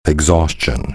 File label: exhaustion